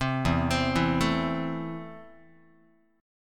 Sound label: E Suspended 4th Sharp 5th, Guitar, Esus4#5 chord; arpeggiated; JGuitar